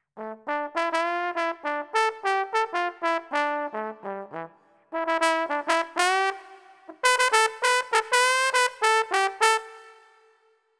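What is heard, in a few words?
101 Jazz Trombone A minor; Brass; Pro Tools Essential Loops; Digidesign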